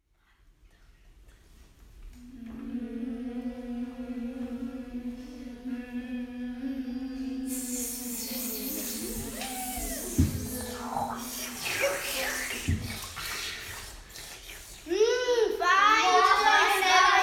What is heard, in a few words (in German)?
Sommer Musik